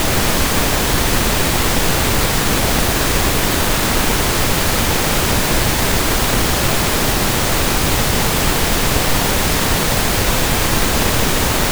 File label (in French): Bruit rose mono